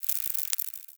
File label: Exterior Forest Critter; Duviri